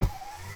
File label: Elevator Door Opening